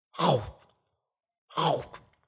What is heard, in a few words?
Cartoon Bite